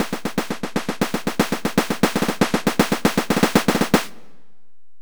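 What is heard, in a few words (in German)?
snare wirbel; Music Store Sound Service; Einstein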